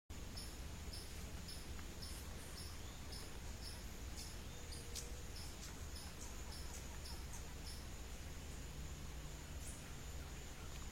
Canto do picapauzinho do amazonas

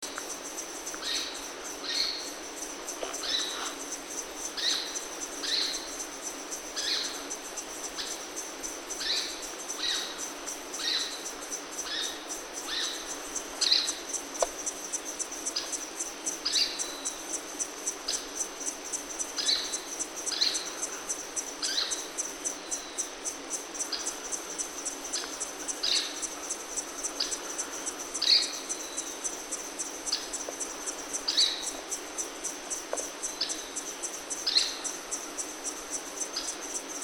bem te vi rajado canto

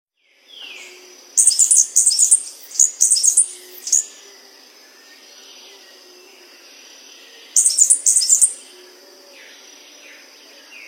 canto da cigarra preta

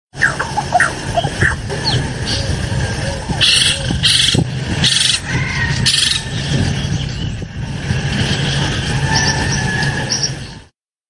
canto do pica pau de coroa vermelha

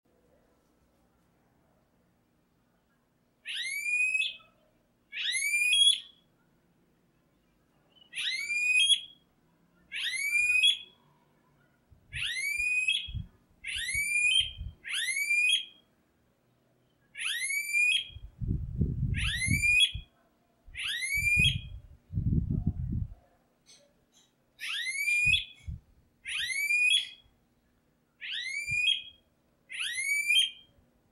Canto do Anu Preto

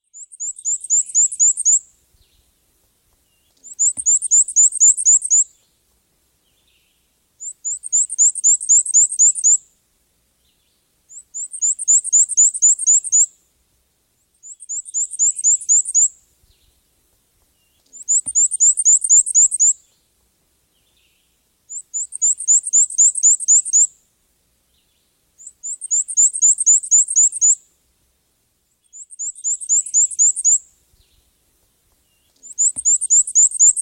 Canto da mariquita riscadinha

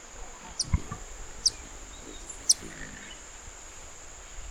Canto do beija flor de leque canela

